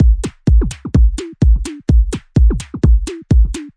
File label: 127 bpm minimal wibble wobble, drum loop